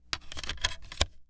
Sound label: Electronic CD Place In Case